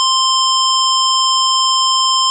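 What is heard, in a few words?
string C4; fleet keys Samples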